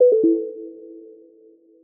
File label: device disconnected; Linux; Celestial 2.1